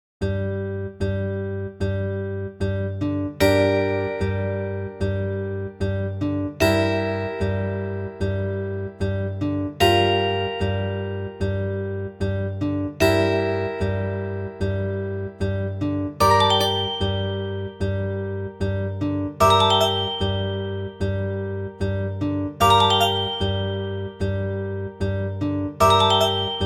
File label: Dungeon; u4-msdos